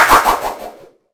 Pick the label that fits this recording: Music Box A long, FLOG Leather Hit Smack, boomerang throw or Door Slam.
boomerang throw